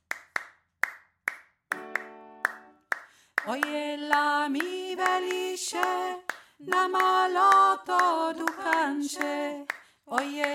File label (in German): oy ela mi veliche mittelstimme